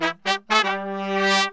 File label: 120 AM L, BRASS SAMBA